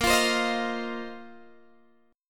Bb Suspended 2nd Suspended 4th Bb down Guitar Open G; Bbsus2sus4 strummed